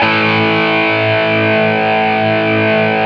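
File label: GTR POWER, Power Chords; Club-50 - Foundations Roland; Metal Chds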